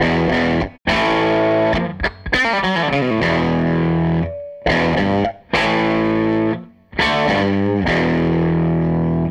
Gretsch Guitar Loop

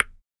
PERC CLICK, MALE PERC; Spectrasonics Vocal Planet; Jazz FX

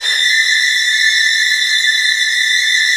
VN TRILLS, Virtuoso Strings; ILIO; Kirk Hunter Virtuoso Strings VOL-1

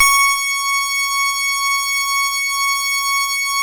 C#, PIANO; Big Fish Audio; Synclav FM

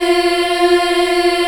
F3 child EH L, Choir; USB Soundscan vol.28

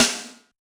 Yamaha DJX 2B, SD Rock Rim

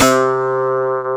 clavius C3

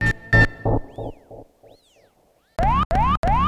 Sound FX; Sonic Foundry (Sony Creative Software); Stylus Pressure Urban Grooves on Digital Wax; Summer Jam

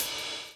DOWN, DOWN KIT 78

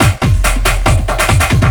Drum & Bass, 140 BPM; Ueberschall; Drum & Bass Flash Trackz

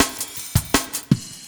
LOOP SD, 160 BPM; Ueberschall; Drum & Bass Flash Trackz

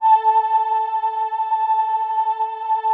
VP-330, String Machines

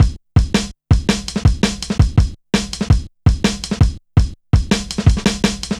Persistent, Jungle, Drum Loops 165.5; Zero-G Jungle Warfare 1, 2 & 3

